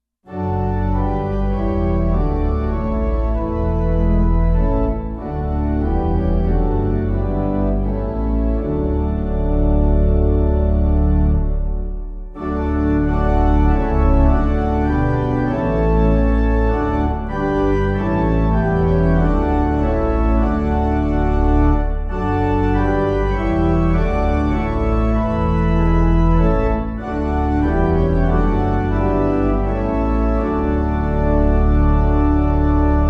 Hymn Pipe; Sing Praise to our Creator; Hymnal 1982

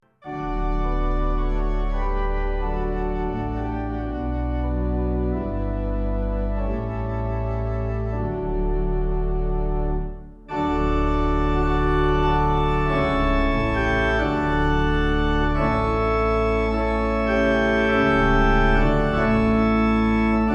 Hymn organ; My faith looks up to thee; Olivet

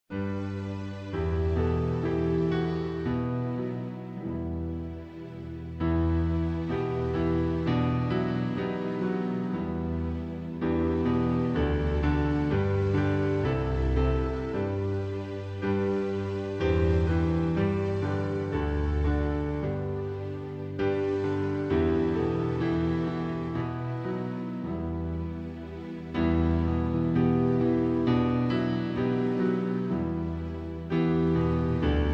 Hymn Instrumental; Erhalt uns Herr; Hymnal 1982

